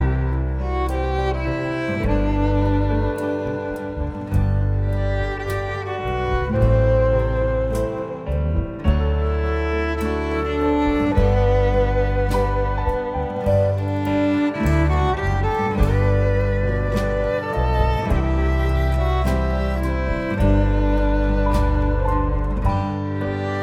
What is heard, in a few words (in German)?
Wie gross bist du Instrumental